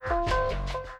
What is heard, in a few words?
income msg